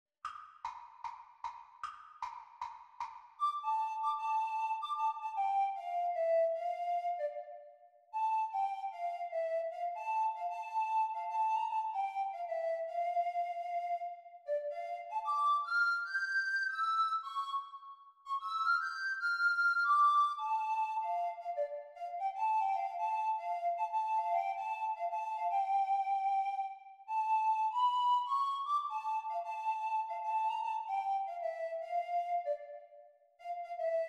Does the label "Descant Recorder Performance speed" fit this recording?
Yes